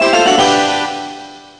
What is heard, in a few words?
level reached; Magical Drop VI; Windows